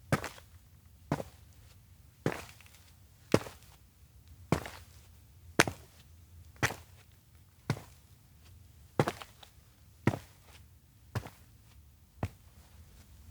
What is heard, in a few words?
steps outdoor road princess carry; LOOP; awayuki; anemoi